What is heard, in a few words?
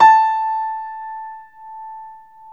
PNO Grand Pno; Club-50 - Foundations Roland